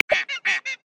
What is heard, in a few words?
Tasmanian Native Hen, Australia; Xiaomi Redmi-Note-8-Pro